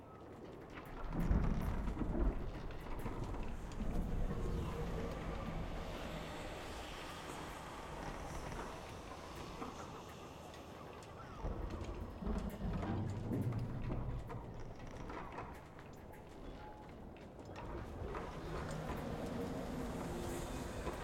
amb interior; bilgewater

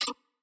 opponent locked

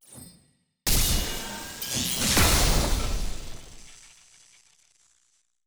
sfx skilltree ceremony lightning crest up; tft

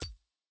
button circle gold click; summoner icon picker

